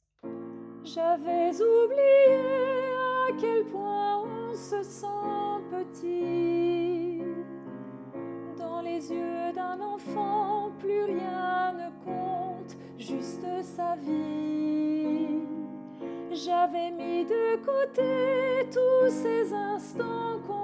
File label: alto